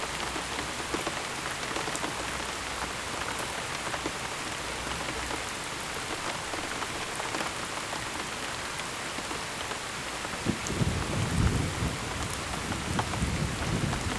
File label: Binaural Beats Brain Waves Isochronic Tones